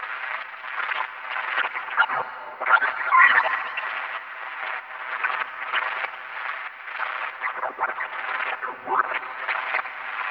heavy drone

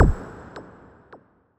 pistol

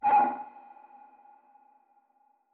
create tree

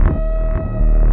alien drone

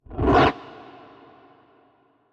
absorb boulder

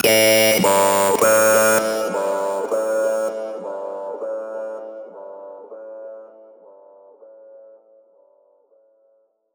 gameover

